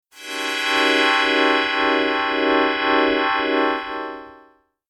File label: upchord; dice demo